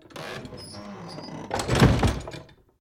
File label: door close; Golden Crayon Sounds